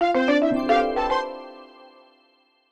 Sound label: music motif win